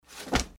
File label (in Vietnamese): âm thanh tiếng thả quần áo rơi trên giường